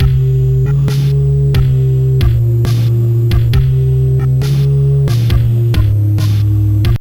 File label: strings; MED; Czar